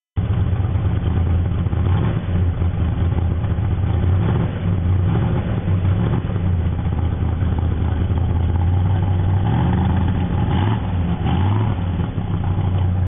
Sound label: gsx, exhaust systems